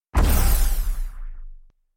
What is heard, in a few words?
portal exit; diablo 3